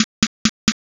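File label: footsteps asphalt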